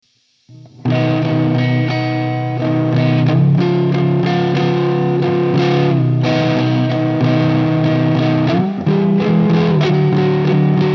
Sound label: OK Strum